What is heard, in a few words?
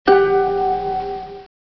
doong